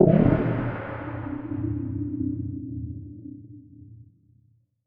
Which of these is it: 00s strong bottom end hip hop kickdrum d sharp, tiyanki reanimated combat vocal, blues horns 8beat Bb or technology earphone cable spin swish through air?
tiyanki reanimated combat vocal